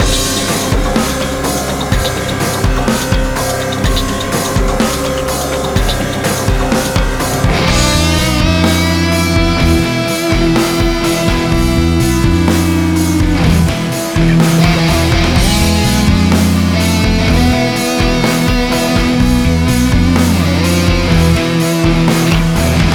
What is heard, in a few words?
theme, music combat